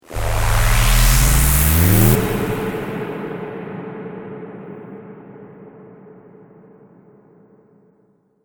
Trailer Riser Electro, Rise And Dive Sound Effects